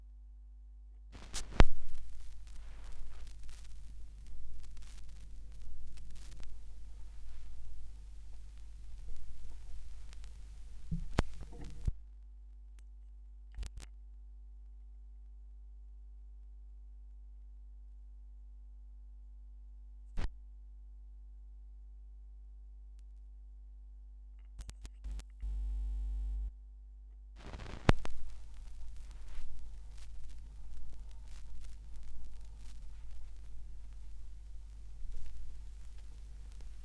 Schallplattengeraeusche

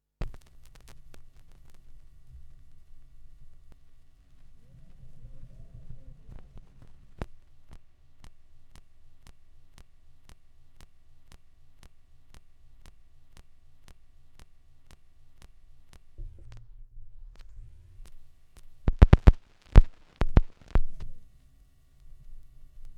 schallplattengeraeusche, Vinyl

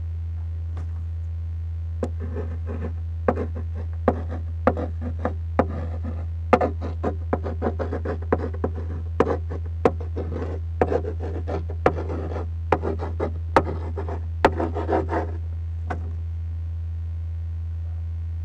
kuli plaste; Pistazie und Konsole

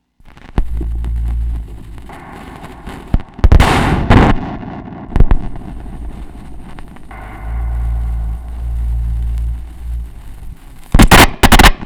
schallplattengeraeusche, Tape Echo Mix

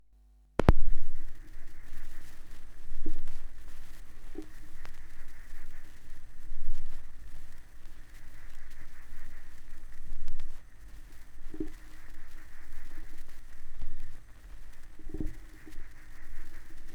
schallplattengeraeusche, Tape Echo Mix

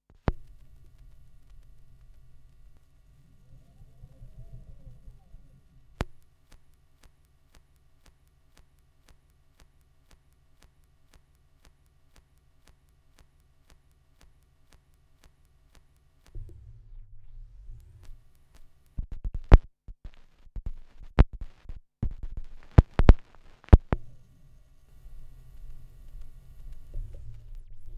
schallplattengeraeusche, Vinyl; wendelrekords 02

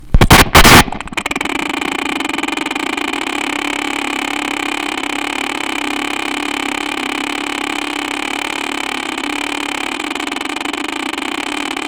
Tape Mix Cut Schallplattengeraeusche

